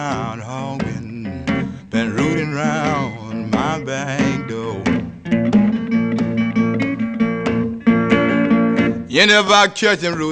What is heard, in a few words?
blues